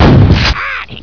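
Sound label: shotgun, weapons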